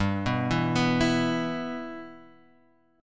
G 6th, Guitar, Half Step Down

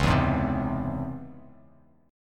Db Minor Major 7th, down, Bass Standard; DbmM7 strummed; JGuitar

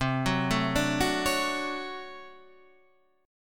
D Major 7th, chord; Dmaj7/C; arpeggiated; JGuitar

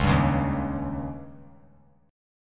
C sharp Suspended 2nd Flat 5th C sharp; strummed; JGuitar

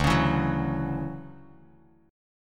D-Major 9th D down Bass Standard; DM9; strummed; JGuitar

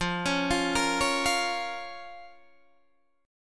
F Suspended 4th Sharp 5th F, Guitar DADGAD

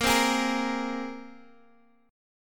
Bb 7th Suspended 2nd, Guitar, Standard; x,13,10,13,11,x; Bb7sus2 strummed; JGuitar

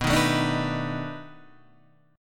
B 7th Sharp 9th, guitar; B7#9 Chord {7 6 7 7 4 7} strummed

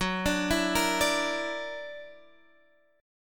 Gb Augmented 7th, Guitar Half Step Down

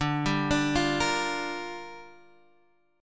G Minor 7th, D

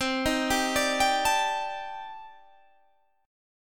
C Minor 6th Add 9th C Guitar Standard; Cm6add9 arpeggiated; JGuitar

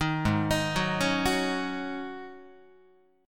Ab 7th Suspended 4th Ab down Guitar

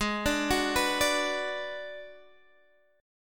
G sharp Minor 7th Flat 5th, Guitar DADGAD; x,11,12,11,14,12

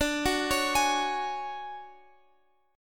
D Major Sharp 11th D Guitar Open F, chord; DM#11 arpeggiated

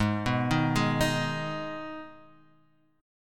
Eb Suspended 4th Sharp 5th, Ab

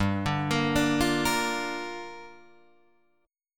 G Minor 7th, Guitar, Drop D

